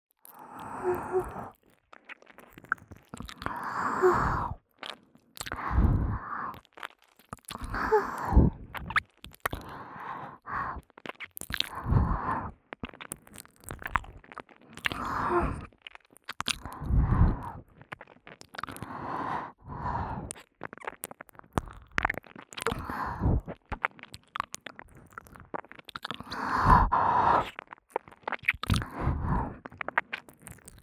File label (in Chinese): (左)舔耳 嘴唇轻含; 舔舐声特化; 双耳立体声